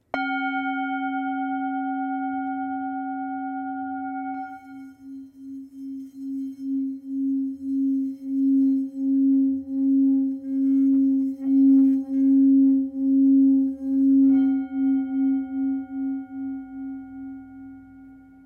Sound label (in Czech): tibetska misa; Tibetská mísa Chuto střední; palička na hraní; Obrácený zvon, meditační mísa, léčivá mísa, himalájská zpívající mísa, tibetská mísa